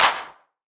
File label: Clap